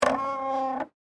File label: Creak Plastic Stress, Texture, Creak, Plastic, Stress; Creaking Sound Effects; Objects